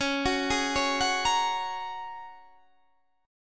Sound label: Ab 7th Suspended 2nd Suspended 4th, Db